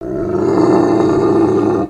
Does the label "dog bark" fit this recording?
Yes